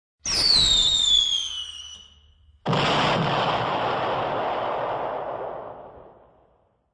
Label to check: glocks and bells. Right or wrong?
Wrong